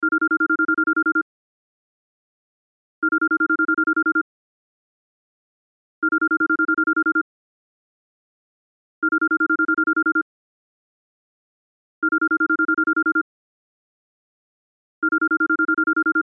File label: Cell Ringtone; Loop; Cell Phones; Blastwave